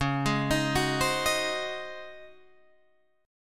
C sharp Major 7th Suspended 2nd Suspended 4th C sharp, Guitar Drop D